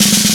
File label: Looped Roll; Mo' Phatt; Digital Sound Factory Mo' Phatt